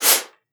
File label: PUSTE, BASSOON; Xsample VOL-6